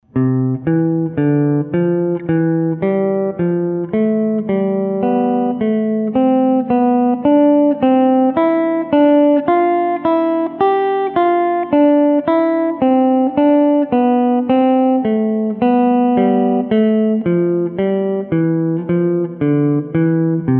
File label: C Scale Thirds Pattern, guitar; introductory guitar skills worksheets